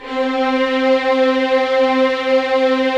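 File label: Strings Violins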